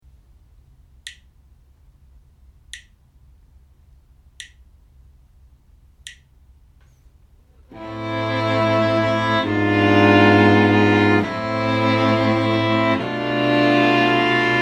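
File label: harmonic dictation, string quartet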